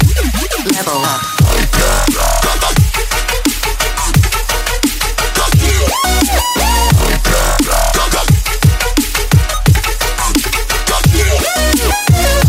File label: Ding